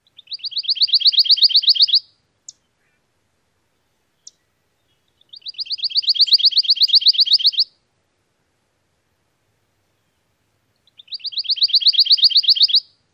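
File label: songs, Pine Warbler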